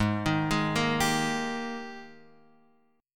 G sharp Major 7th Suspended 2nd; G#M7sus2 Chord {4 6 6 3 X 3} arpeggiated

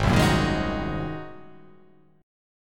Ab 9th Ab down; Ab9 strummed

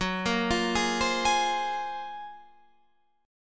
F sharp Major Sharp 11th, Guitar, Standard; arpeggiated; JGuitar

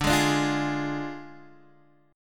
D Diminished Guitar Open D, chord

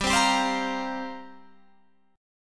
F Suspended 2nd Suspended 4th G

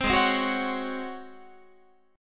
G sharp 11th G sharp, down, Guitar; G#11; strummed; 15,16,15,16,17,17

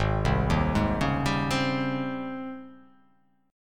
G sharp Major 11th G sharp; 4,3,1,1,1,2,2,x; JGuitar

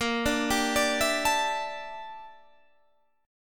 G Minor 6th Add 9th A sharp

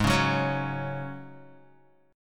G sharp 7th Suspended 4th G sharp, Guitar; G#7sus4 strummed; 3,4,6,6,6,x; JGuitar

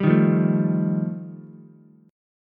G 7th Suspended 2nd Sharp 5th G chord, Ukulele; strummed; JGuitar

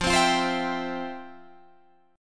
F Major 7th Suspended 2nd, Guitar, Drop D; down; chord shape

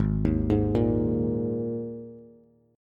Ab Suspended 2nd chord, Bass; arpeggiated; 4,6,6,3; JGuitar

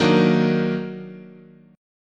Eb Minor Major 7th, chord

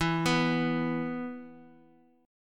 E 5th E down

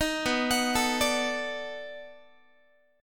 B 7th Sharp 9th, down, Guitar; B7#9; arpeggiated

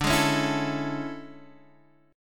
A Major 7th D; AM7/D Chord {X 5 6 6 5 5} strummed

